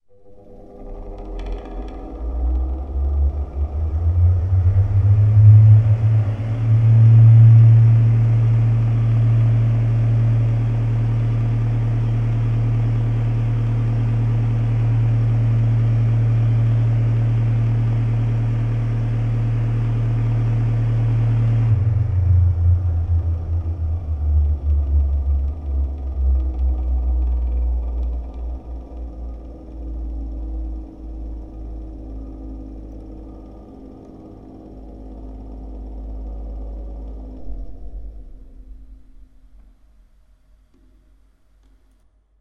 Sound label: Big Ventilator